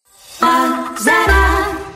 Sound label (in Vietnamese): am thanh thong bao; lazada